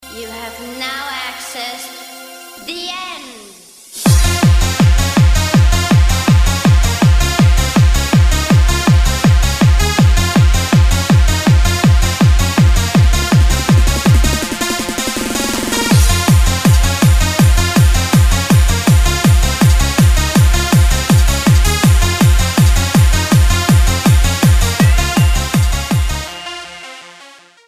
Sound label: Trance Ringtone